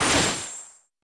Spell Magic Spirit

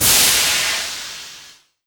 Arm Steam

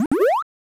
Heal; Syrup 2 Candy Alchemy RPG

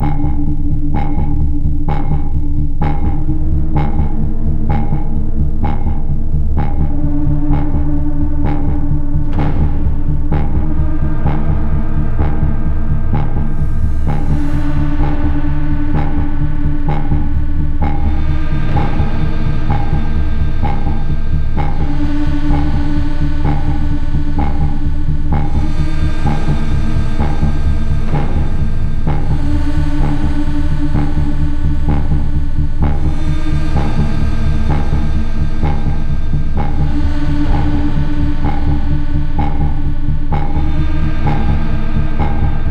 BGM Lobby; starbites

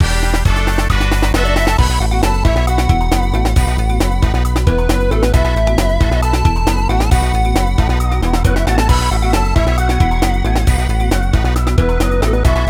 Boss Defeated; Ganbare Goemon Oedo Daikaiten; PlayStation

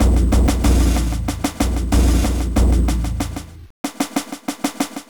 Elk fanfare; Inherit the Earth; amiga

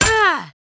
Paladin Damaged

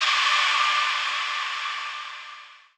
Scream

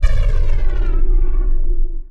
enemy Spider Self Destruct; Mars Sortie

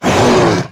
Monster Attack; Monster George Attack

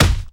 Pirate Birdnest

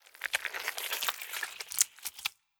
Tridentgore

